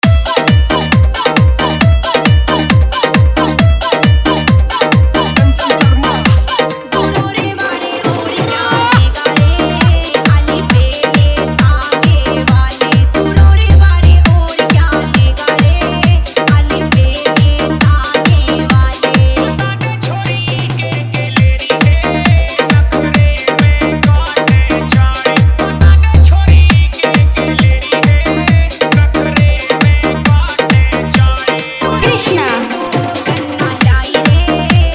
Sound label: Hariyanvi Song Remix